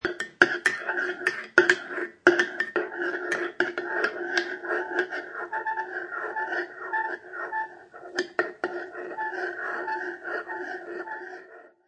Bowl Stir Spoon, Spoon stirring sound in ceramic bowl or mug; Food Prep; Restaurants